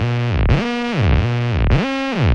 rubber g-funk; Loops; Electronic

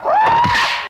scream martial arts; ooh ahh

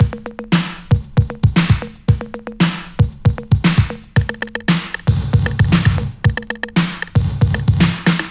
Drum loops, Electro

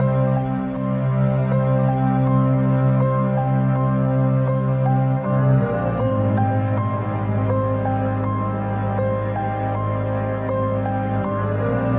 piano and strings, Sad Loops

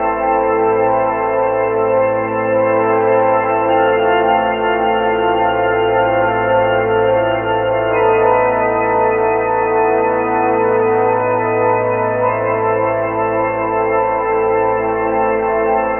organs; Loops; Electronic

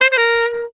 figaro trumpet, Stabs

